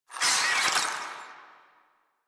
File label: Infested Ashen Vocal Attack Death; Warframe